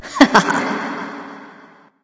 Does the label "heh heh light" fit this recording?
Yes